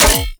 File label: Full Draw Mech, Weapons; Warframe; Infested; Derelict Cernos Prime